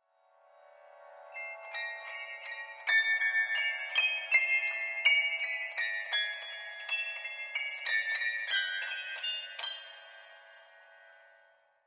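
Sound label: Grand Piano Strings High; keyboards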